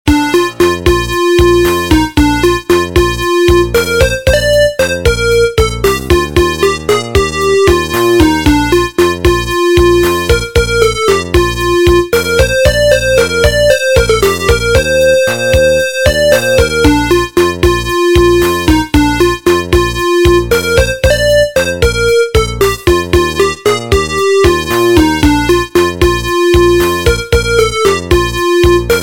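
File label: instrumental; Indian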